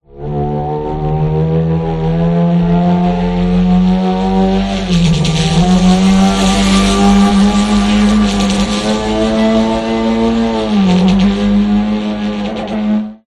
Engine Ripper Prelayered Constant Designed Futuristic Scifi; PMSFX